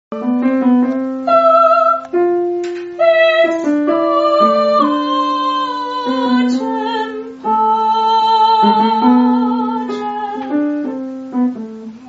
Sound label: soprano; agnus dei